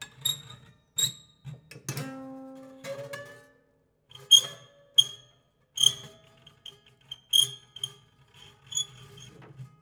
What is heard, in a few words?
Plier Squeak, Piano Destruction